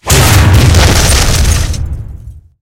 tank hit aphe mid; tanks; War Thunder

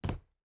footstep barefoot wood jump land; Rust

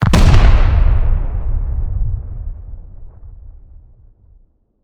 satchel charge explosion; Rust

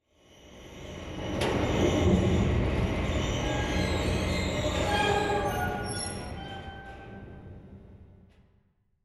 hangar layer

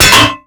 dest hydrant land

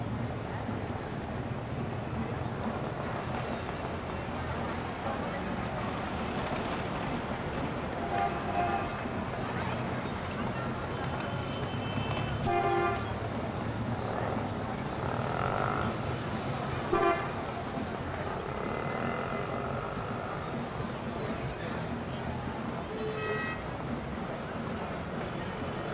City Late Large Bed, Ambient; Civ4 warlords

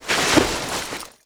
foot prone cement; footsteps

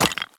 sprint tile; Escape from Tarkov

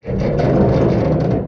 damage vehicle; World Of Warships

